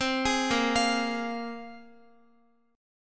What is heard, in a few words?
A sharp 7th Suspended 2nd A sharp, Guitar; arpeggiated; chordshape; x,x,12,13,10,13